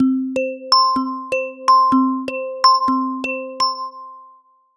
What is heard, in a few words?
Notification arp